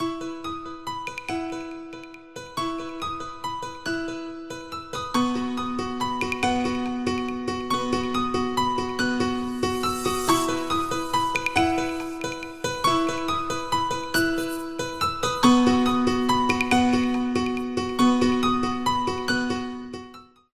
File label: morning vibe ringtone; i phone x plus